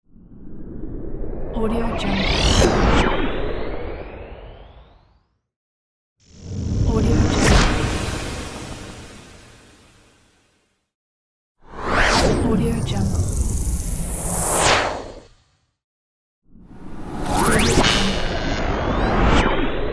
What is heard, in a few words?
alien transition sound effects